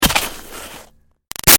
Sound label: Snow Impact Gritty Icy Scrape; Weather